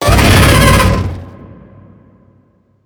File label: worm roar alt, creatures; Factorio